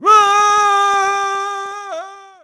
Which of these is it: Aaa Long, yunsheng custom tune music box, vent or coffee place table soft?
Aaa Long